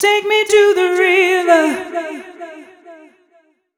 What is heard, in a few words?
f 126 mel f-sharp, fem Vocals